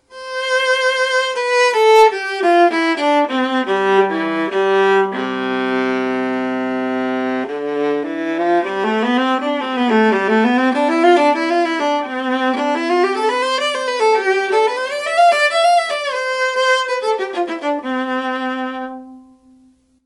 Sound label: viola Sound Sample; Model 1